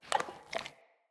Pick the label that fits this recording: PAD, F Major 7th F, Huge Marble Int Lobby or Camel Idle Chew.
Camel Idle Chew